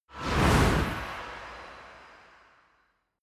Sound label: whoosh